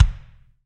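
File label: bass drum, Roland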